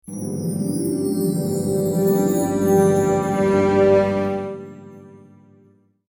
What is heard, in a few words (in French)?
Harpe